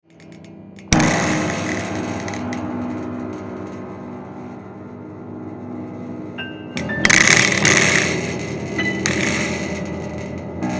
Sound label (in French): Piano Prepare; avec le AKG C542 BL